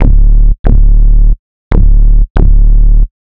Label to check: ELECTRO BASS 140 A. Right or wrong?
Right